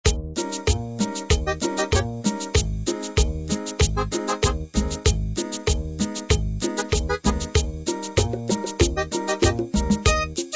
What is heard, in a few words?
cumbia